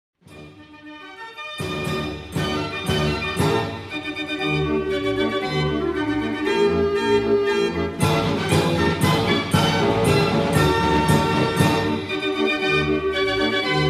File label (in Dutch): draaiorgel; de hagenaar